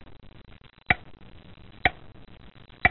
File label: clock tic